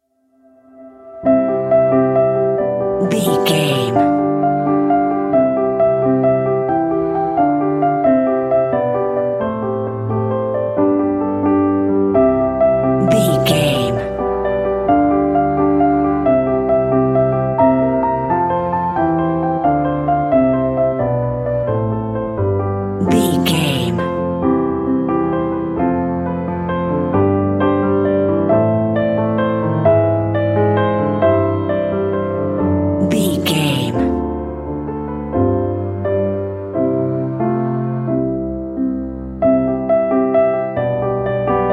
magic piano music

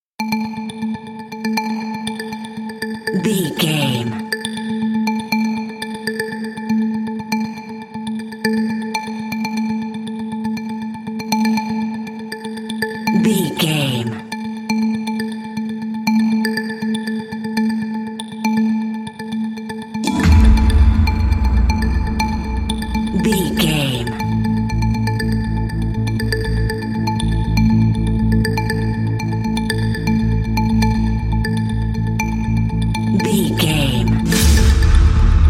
evidence crime drum bass

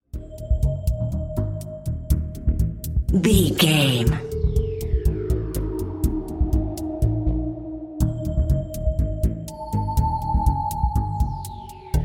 mysterious mission video game

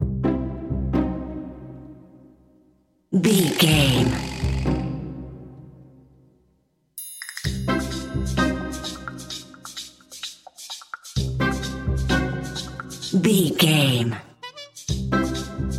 wacky inventor

